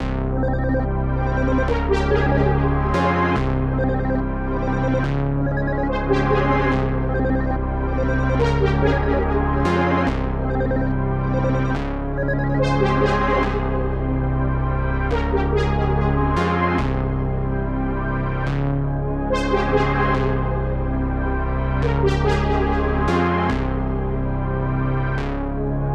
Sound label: 143 BPM C Min, hip hop melodies; Sucker Punch